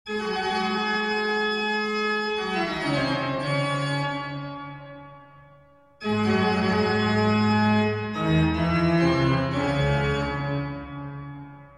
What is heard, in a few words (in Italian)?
Toccata E Fuga D-moll